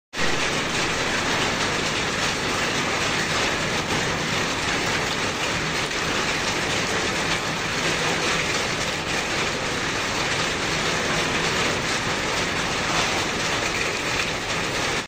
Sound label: Rain on roof